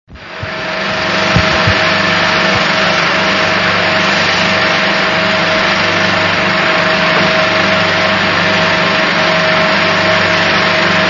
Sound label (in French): machine moteur projo